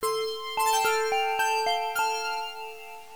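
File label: power off; Samsung GT B5210U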